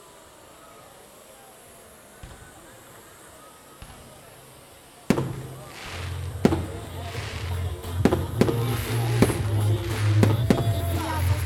tűzijáték

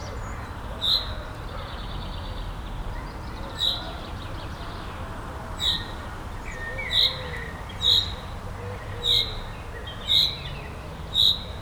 a főútvonal közelében, tücsökmadár; jaszberenyi zoo 2016

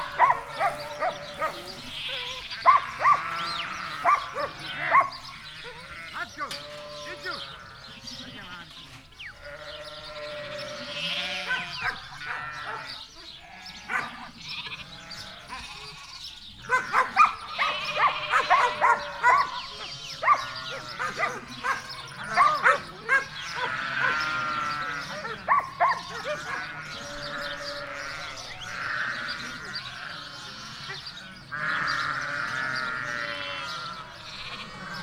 balra nyaj es juhasz, jobbra terelo kutya; laszi tanya

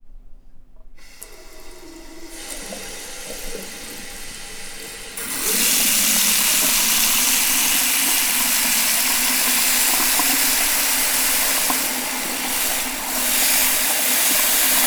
egyszeri, mosdó; angyalfold

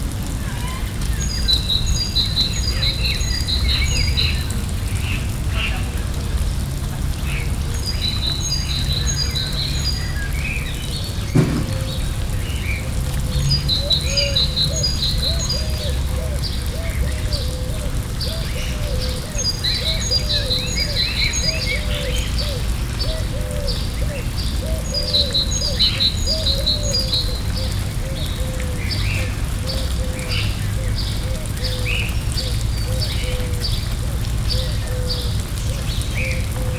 szökőkút közelében, győri zoo hangulat; gyori zoo 2014

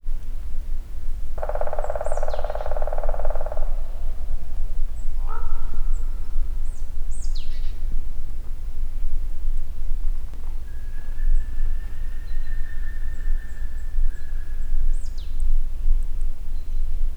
feketeharkaly; termeszetben

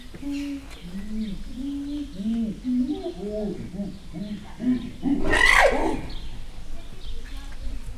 szabadban kialt, csimpanz; gyori zoo 2014

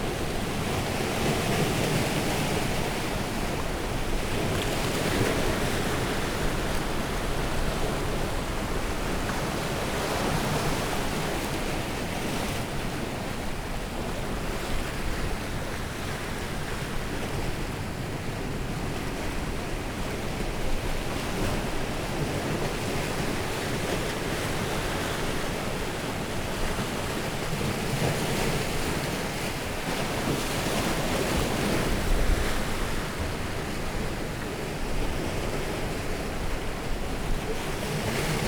zajos hullámok, ocean; orzola

